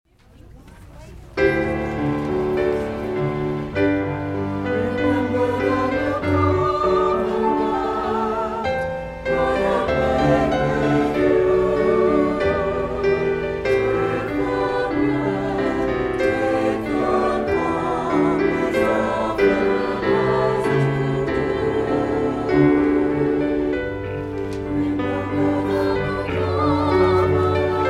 SATB

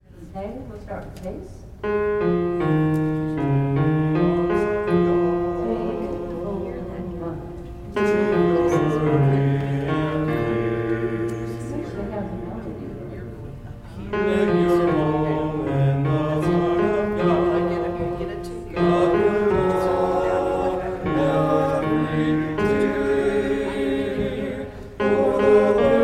bass; Gather Hymnal

